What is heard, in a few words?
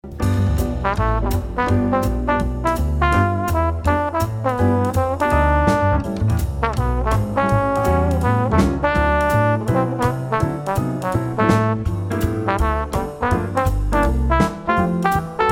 Solo variation